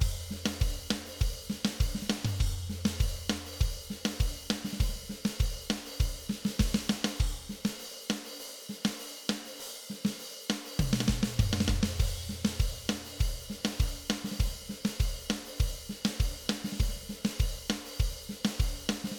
Cumbia Chorus

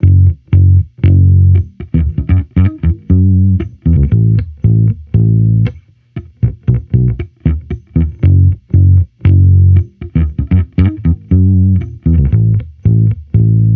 Amped loop 117 Gm, Electric Bass Loops; Slate Digital BASSHOLE Sample Pack

